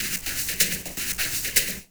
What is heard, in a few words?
125 Dance Perc; Dance DJ; Percussion